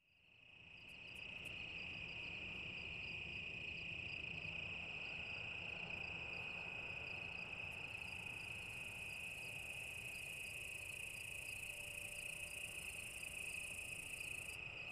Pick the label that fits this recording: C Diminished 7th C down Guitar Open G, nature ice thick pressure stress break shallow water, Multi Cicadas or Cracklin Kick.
Multi Cicadas